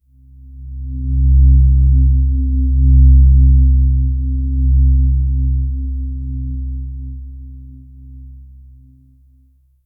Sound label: a gentle magical sound, celestial